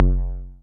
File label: Multi-Low-Perc